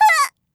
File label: Girl Hit; MineGirl